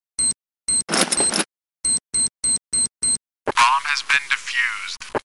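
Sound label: defusing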